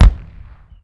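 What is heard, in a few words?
Ground Hit; Falcon4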